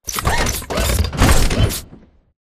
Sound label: bt run dodge left; Titanfall 2